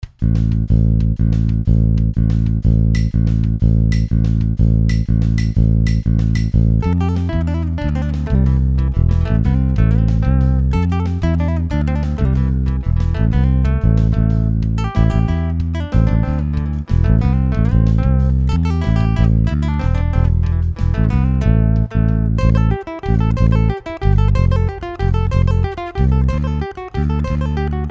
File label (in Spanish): bajo